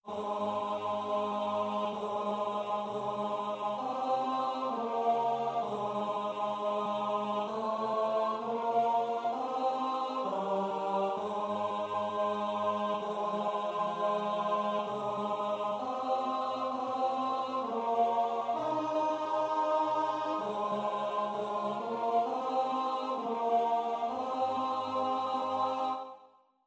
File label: Psalm tenor